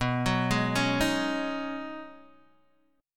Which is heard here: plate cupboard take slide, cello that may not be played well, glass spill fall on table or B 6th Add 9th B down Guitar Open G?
B 6th Add 9th B down Guitar Open G